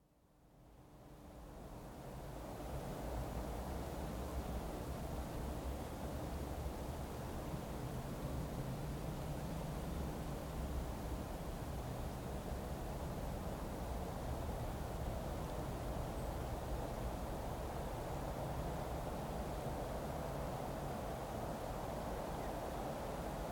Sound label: Daytime, open rural skyline, occasional gust of wind and rustle of leaves; Outdoor Sound Effects; Countryside; BBC